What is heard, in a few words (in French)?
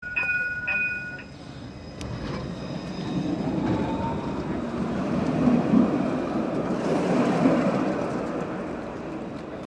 bruit du tramway